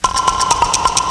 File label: clack